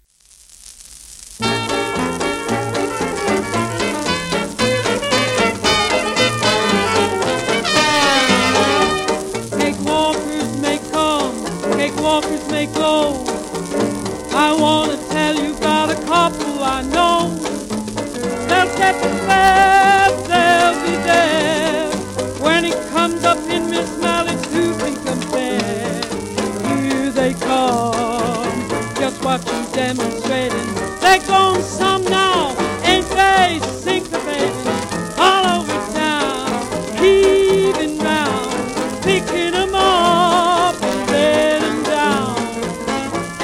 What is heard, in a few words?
bell